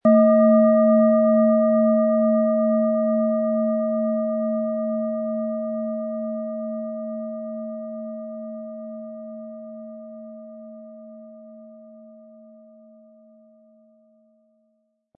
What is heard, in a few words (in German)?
Planetenschale Biorhythmus Seele, Ø 11,1 cm; 100-180 Gramm inkl. Klöppel; Klangschale